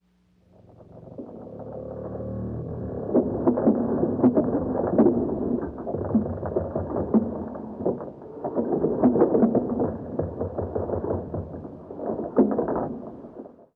Creaks Slow Wooden

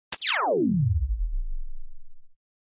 Descend HF Laser Hit

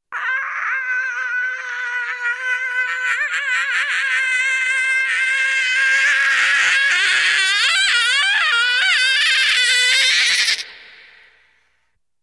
Sm Animal Falls Funy; animals

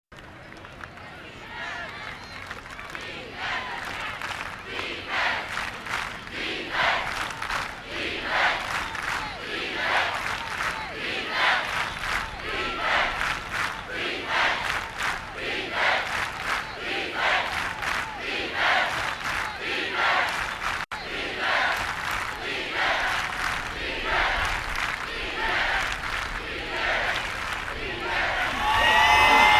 Football Crowd Defe, sports arena football

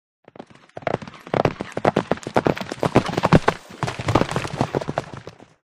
By Single Horse Cante

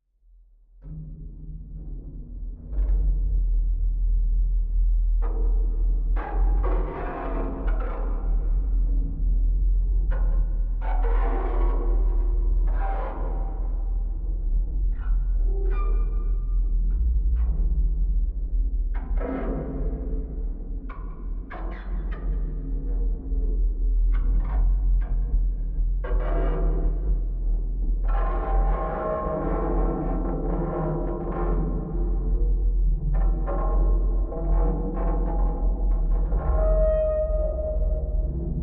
Underwater Metal Str, metal groan atmospheres; Underwater Metal Stress Ver 4

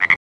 Frog Croak Single

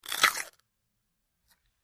Bite Carrot Juicy

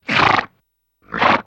Horse Snort Generic X

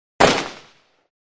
Ak47 Automatic Rifle

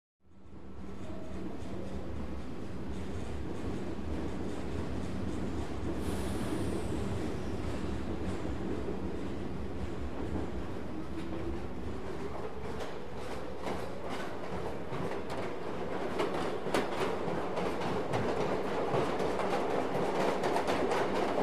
Subway Platform Trai, subway

